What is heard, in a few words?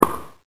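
bike ball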